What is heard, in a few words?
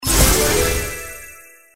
lightning strike big; Candy Storm